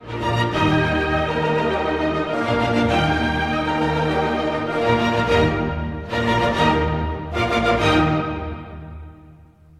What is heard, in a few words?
beethoven 5th eb chord